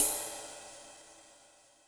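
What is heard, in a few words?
Ride sizzle A, Yamaha DTXpress Cymbal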